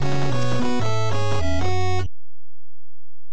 Unknown Jingle; Hudson Hawk; nes

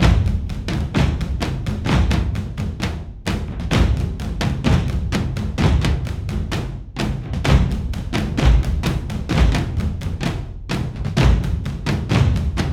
Drums; For Honor

